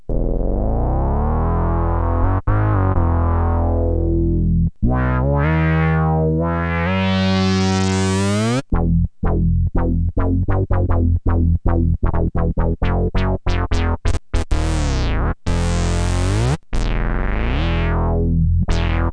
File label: bass; nebulus